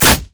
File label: spider basic attack; Elise